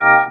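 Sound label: Talk Box organ "ah"; E-MU Producer Series Vol. 8 – Platinum Phatt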